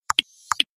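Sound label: Very Short Sms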